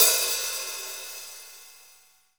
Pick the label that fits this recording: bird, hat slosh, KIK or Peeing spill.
hat slosh